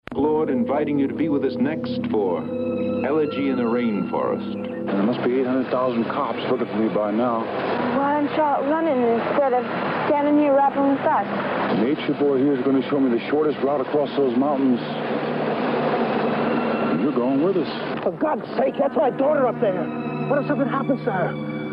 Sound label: rain forest; hawaii